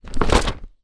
foley directory telephone paper pages thick movement fast